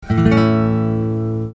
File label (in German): Der A-Dur-Akkord, A-Dur a-cis-e; Grundakkord (Tonika); Harmonielehre